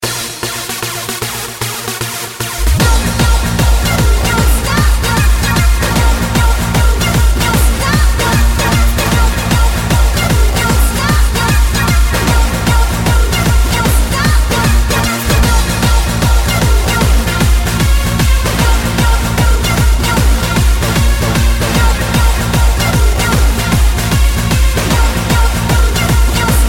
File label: Trance-hard